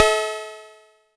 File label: Cowbell